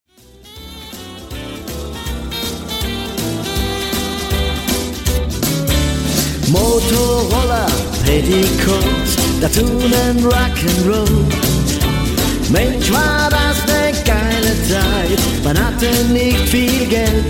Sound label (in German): Motor Roller; Petticoat